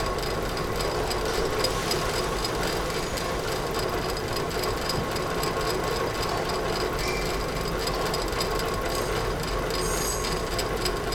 Print Shop Interior Machine Close Perspective Robot Air Release Wheels; The Soundcatcher